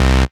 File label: Basses Root C